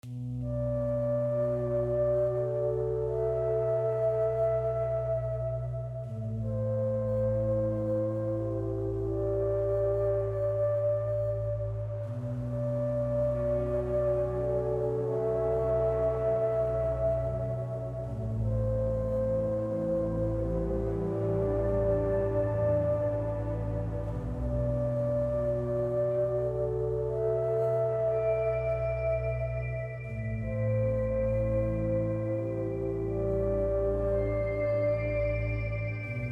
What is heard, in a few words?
low noise morning